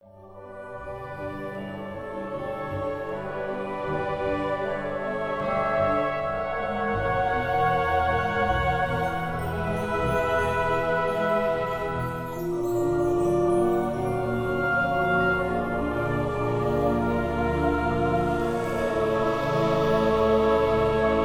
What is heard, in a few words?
forest music